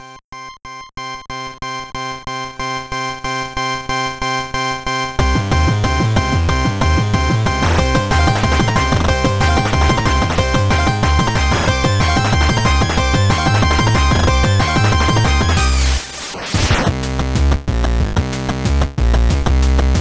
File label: 8Bit loop Start Nocry; DPPt